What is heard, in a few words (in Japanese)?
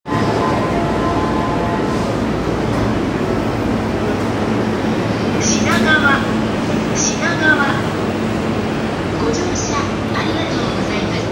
京浜東北線品川南